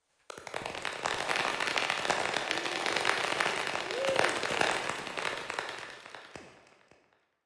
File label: Applause